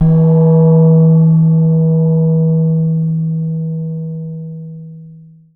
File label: tam tam, gongs; Voice Crystal - Steve Reid Definitive Percussion